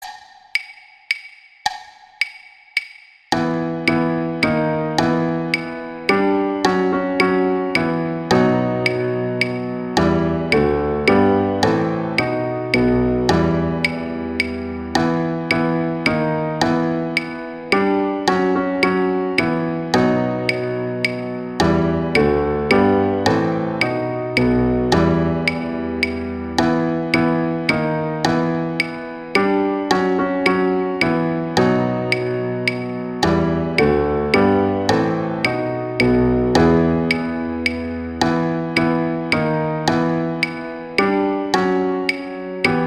The Coventry Carol; Christmas

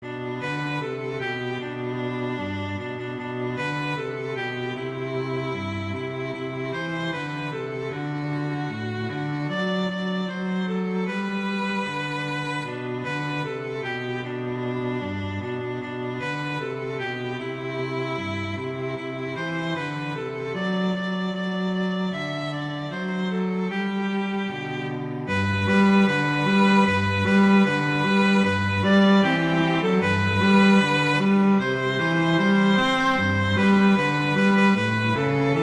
Jingle Bells, Christmas

